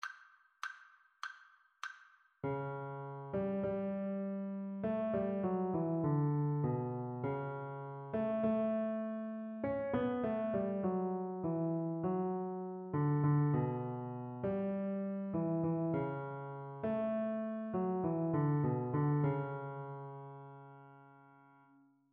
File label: melodic dictation; eartraining